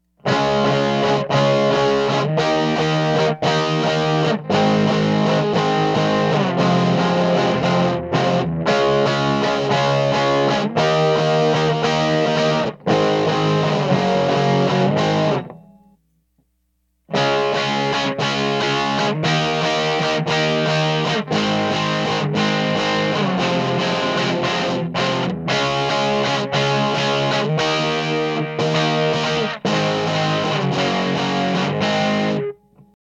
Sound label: Geetar Tonez amp Eq Experiment